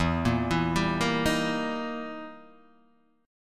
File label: E Minor Major 7th Flat 5th, E, down, Guitar, Half Step Down